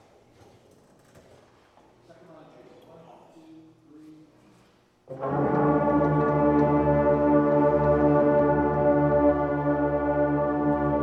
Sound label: Warm Down, brass; north star brass practice woburn ma